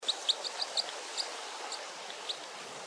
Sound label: chatter; Red Crossbills; Bird Song Ear Training Guide